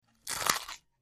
Bite Carrot Juicy; punches kicks hits bones

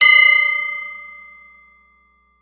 Ding Metallic Hit, chimes & bells

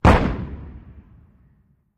175mm Howitzer: Fires. Stereo

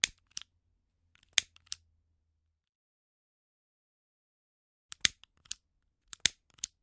Lighter Cigarette Pla; lighters & matches

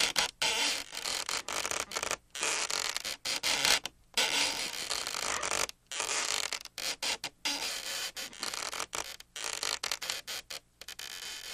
Plexiglas Squeak

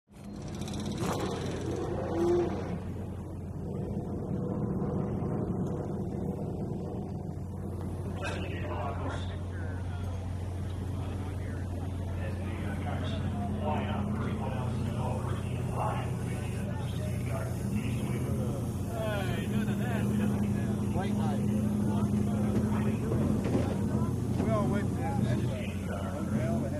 Sports Car Race Pit

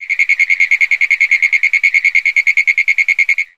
Frog Pinewood Tree

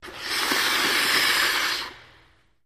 Ice Skating Stops

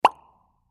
Bubble Pop Dry; bubbles

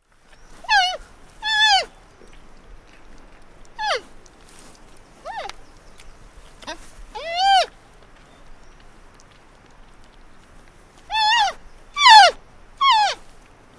Panda Bleats Breaths

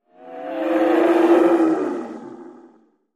Animal Stinger Bull; stingers & whooshes

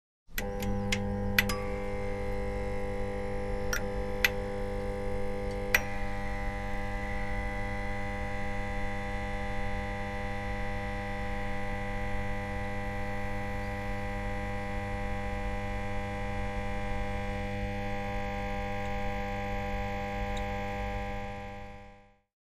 Fluorescent Tube Hum; buzz & hums

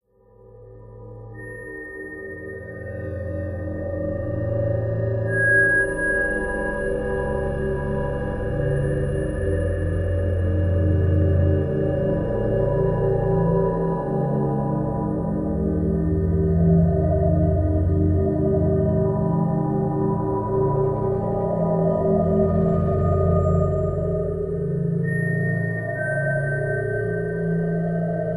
Alien Planet Surfac

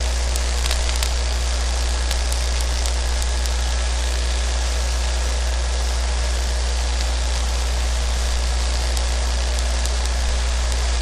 Dam Power, buzz & hums; Guri Dam Power Distr

